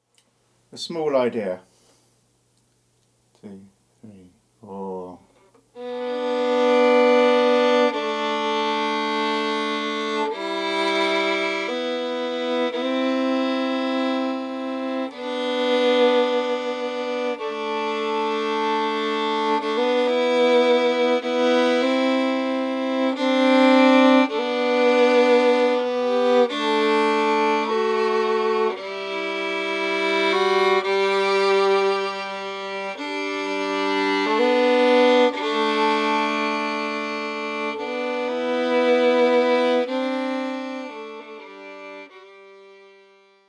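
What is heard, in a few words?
Double stop ideas G D Emin, guitar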